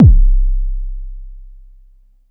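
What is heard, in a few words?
BD Sub, bass drum; Club; VEC1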